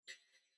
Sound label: run start